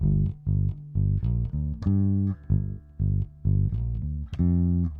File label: bass guitar A